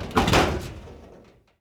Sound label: Aircrafts P-51D Gear Lock Up; DCS